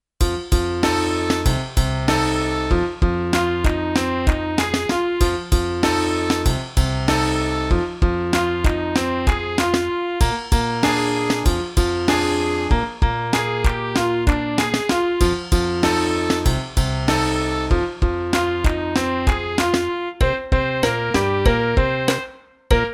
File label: Aggravation Blues